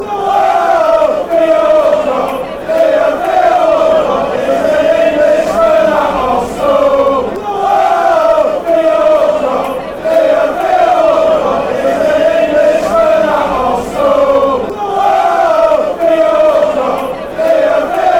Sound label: whoa, fanchants; theo walcott